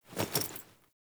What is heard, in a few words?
Female Foley Action Default Dodge, Default Clothing; Characters; Player